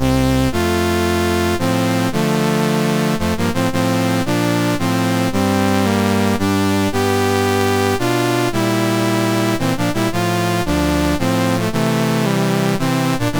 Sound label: Pilgrims Chorus, MOS6581R4